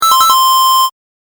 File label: correct; ２連続の正解・ピンポンピンポン; 正解の音・ピンポーン